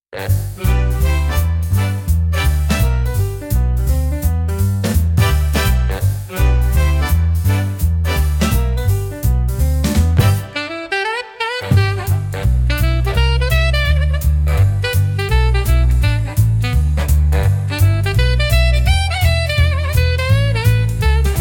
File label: Moonlit Brass Parade, big band swing